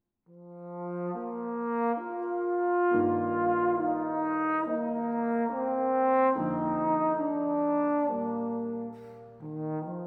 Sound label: Concertpiece for Trombone, trombone and piano